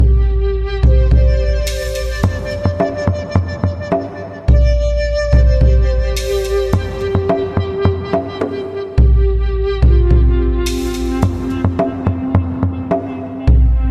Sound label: bgm